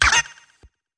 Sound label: down bump